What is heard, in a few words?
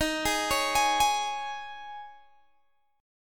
D sharp 7th Suspended 4th D sharp, Guitar Open G